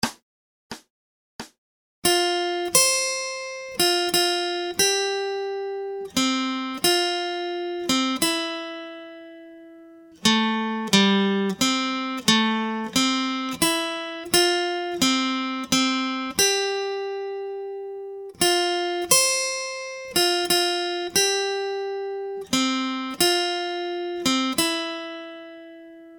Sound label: Acoustic Accompaniment, acoustic guitar